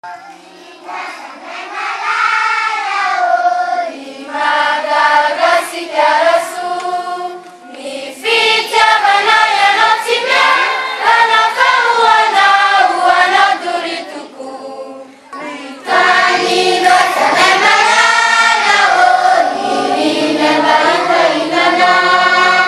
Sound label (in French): hymne national